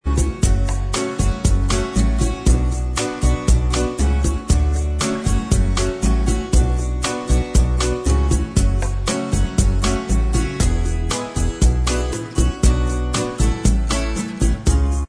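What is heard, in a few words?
bossa instrumental Track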